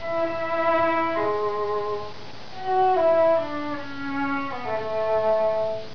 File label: viola